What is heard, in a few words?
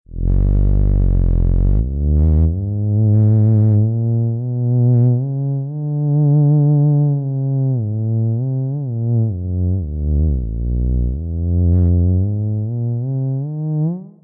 bass